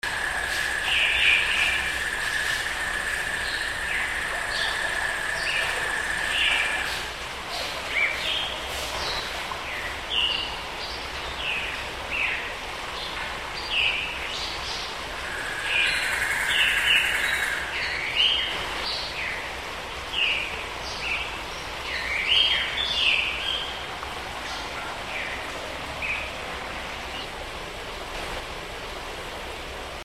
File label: atmo forests tropical birds and stream